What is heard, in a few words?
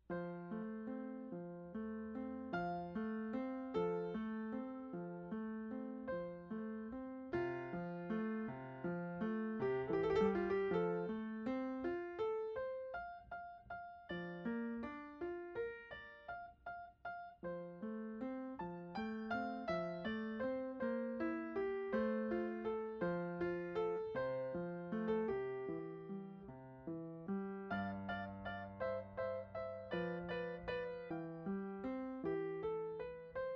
Sonatina No 1 in C Major II Andante; Muzio Clementi